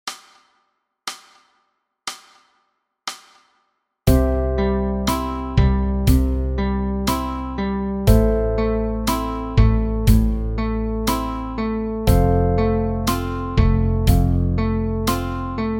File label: Fingerpicking